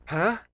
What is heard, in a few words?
"huh"